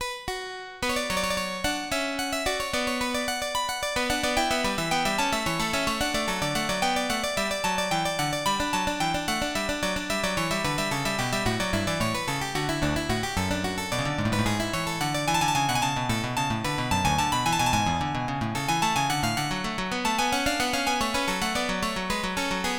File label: gigue; suiten